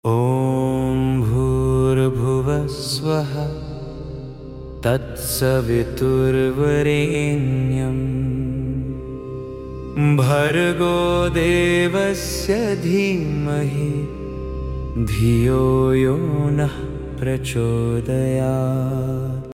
Kannada free soul gayatri mantra melodious chanting